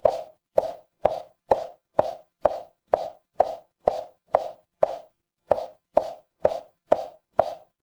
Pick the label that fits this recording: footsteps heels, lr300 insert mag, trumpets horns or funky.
footsteps heels